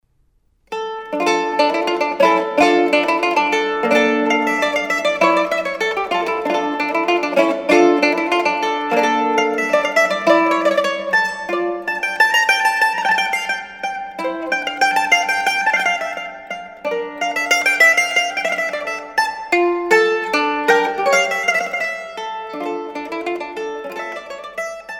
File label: Suite Allemande, mandolin